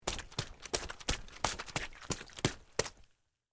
Mud Run Short, Footsteps, Mud, Run, Short, Water, Splash; BlastWave